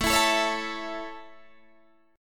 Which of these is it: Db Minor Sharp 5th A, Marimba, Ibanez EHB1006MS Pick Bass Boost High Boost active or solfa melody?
Db Minor Sharp 5th A